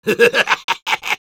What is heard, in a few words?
laugh happy, es; Soldier